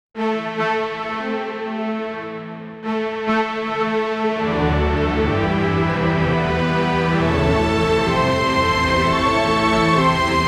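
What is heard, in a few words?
energetic orchestral adventure, heroic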